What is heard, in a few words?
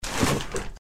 Cloth movment